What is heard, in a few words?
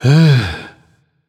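sigh, nonverbal; smite; voicepack; khepri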